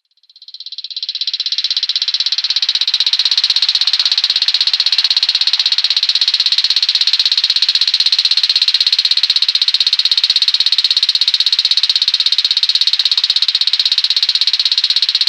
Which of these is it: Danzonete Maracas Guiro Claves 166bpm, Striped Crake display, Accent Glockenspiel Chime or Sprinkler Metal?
Striped Crake display